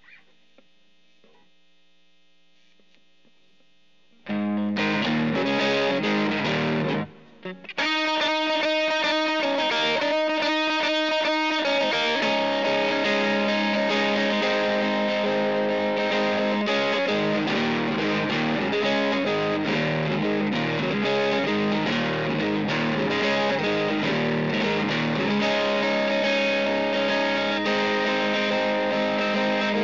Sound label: GTR; Pro Tools